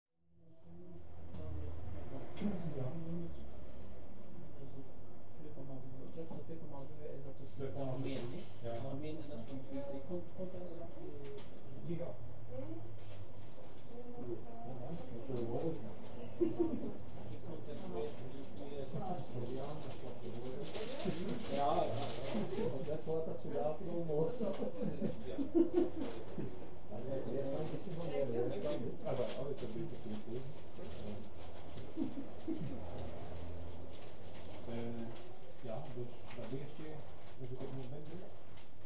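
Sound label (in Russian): разговоры небольшая толпы людей в магазине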